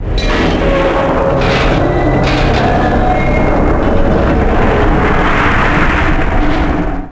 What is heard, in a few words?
radio mast falling; Max Payne; penthouse